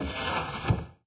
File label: Slide Open; blue archive